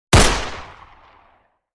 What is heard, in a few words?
9mm handgun single shot